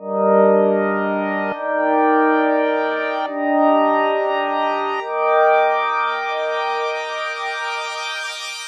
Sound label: op chords